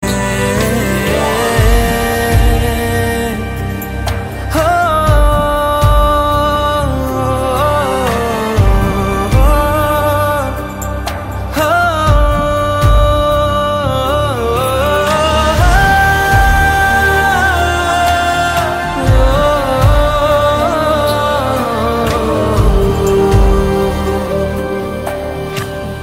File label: Humming Tone